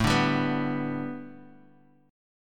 A 7th Suspended 2nd Suspended 4th A Guitar Open G, chord